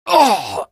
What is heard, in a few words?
pain, barney; hl2; Source engine